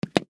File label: Safety Goggles Set Down; Surgeon Surgery; Hospital; Emergency